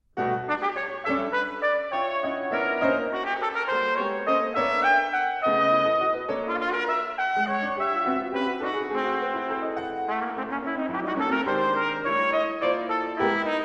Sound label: Sonata for Trumpet and Piano, q=68